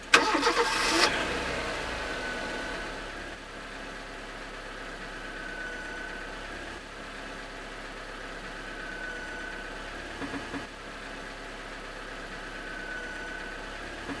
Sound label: car start